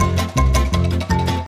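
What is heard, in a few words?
ocean world music cage forcefield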